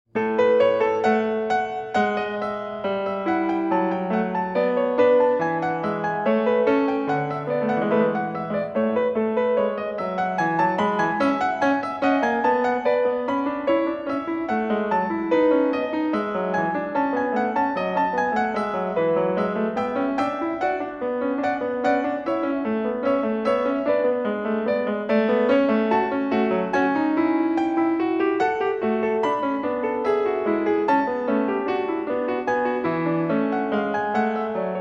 Prelude & Fugue in A major

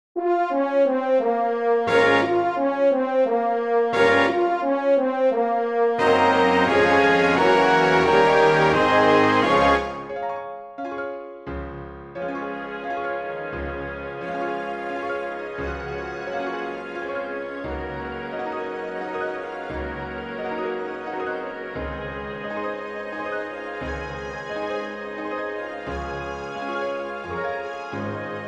Piano Concerto, piano concerto; No.1, Op.23; Tchaikovsky, Pyotr

